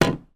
household swimming pool metal net pole down on decking